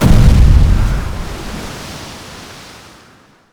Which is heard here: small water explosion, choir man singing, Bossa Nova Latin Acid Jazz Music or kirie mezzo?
small water explosion